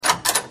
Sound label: latch unlocked, doors